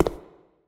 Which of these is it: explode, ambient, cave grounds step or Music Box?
cave grounds step